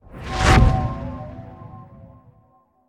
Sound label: spell dark rune; Minecraft; Votive Content